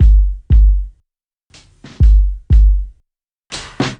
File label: Dubby Kit B